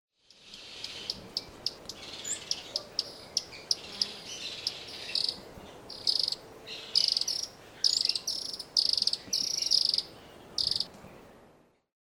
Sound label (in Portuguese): Ferreirinho-relógio Todirostrum cinereum; Jacauna